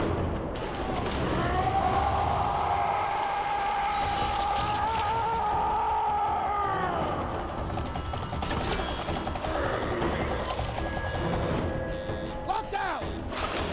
Rrraahhhh

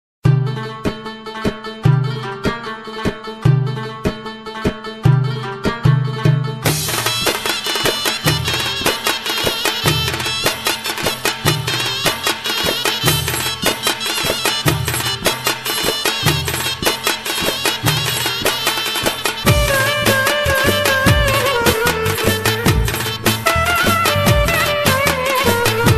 Turkish Dance

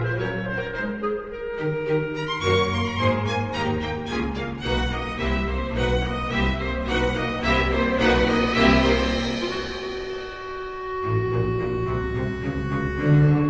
Cheerful Notch

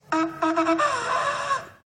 Sad Trumpet

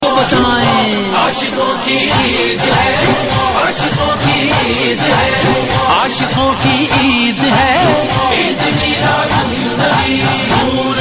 Islamic

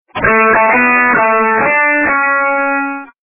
Birthday Sms

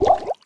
Potion Drop

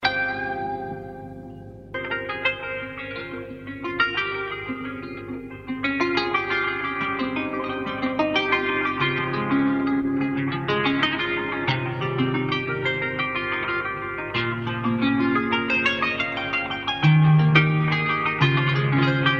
Classical

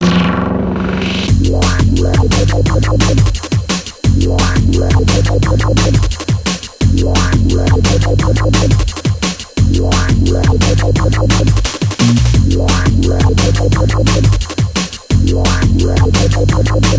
Wobble

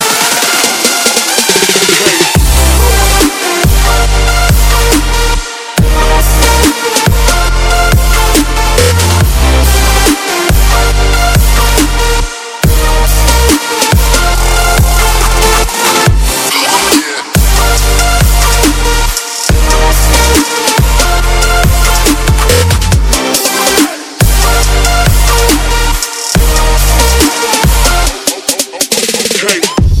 TRAP

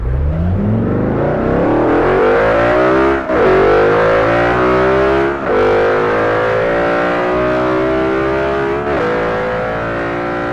Audi R8 Gt

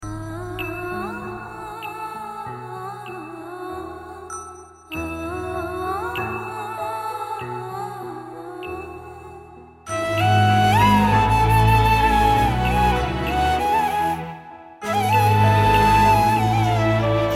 Instrumental

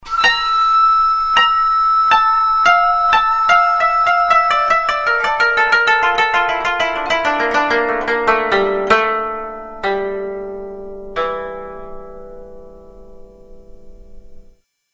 Japanese Classic

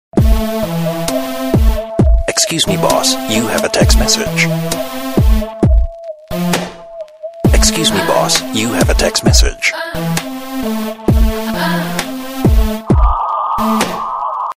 Text Msg

